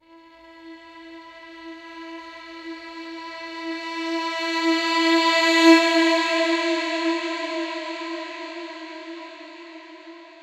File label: Violin; SparkVerb